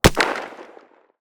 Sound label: Firearm Rifle Shot; Firearms; Zomboid